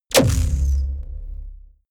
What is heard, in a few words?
sci-fi plasma cannon fire deep space; schusswaffen